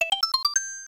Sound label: 1 up; smw2